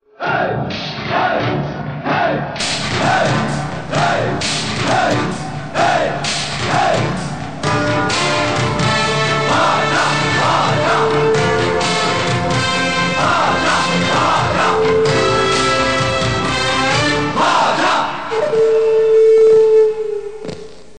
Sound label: tamil bgm ringtones; basha movie; south indian bgm ringtones